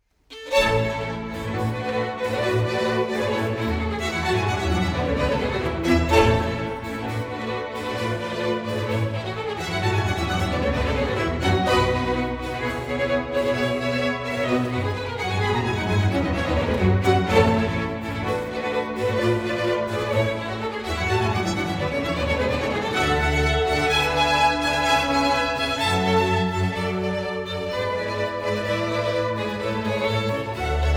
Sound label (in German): Klingelton